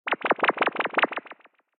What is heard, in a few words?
pain; En sandfish